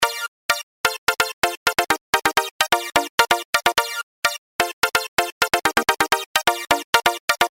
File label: BackBeat